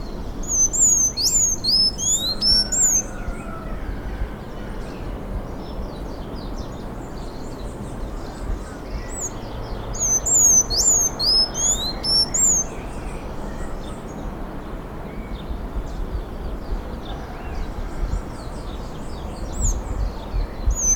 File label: Thraupis sayaca